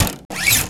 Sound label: Bass Thud Scrape; Proteus 2000; Multisamples; Digital Sound Factory Proteus 2000